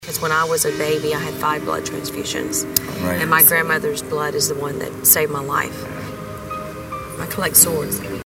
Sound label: swords; Rock of Love